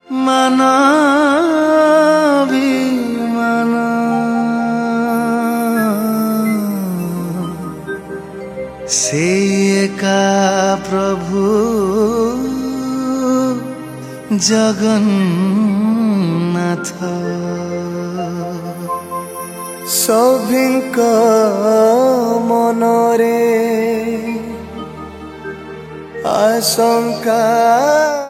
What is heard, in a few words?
Odia Bhajan